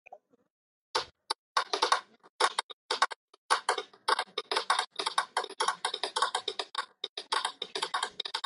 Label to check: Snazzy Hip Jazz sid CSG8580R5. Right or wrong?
Wrong